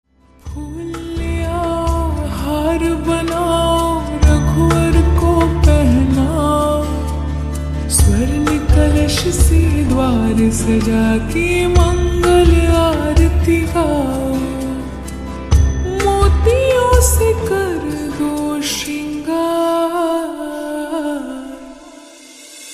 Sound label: Slowed Reverb Ringtone